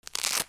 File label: RIPPING